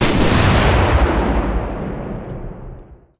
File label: super heavy battleship attack; Hearts of Iron IV Death or Dishonor